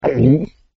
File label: gulp; spongebob; super-easy-fun-adventure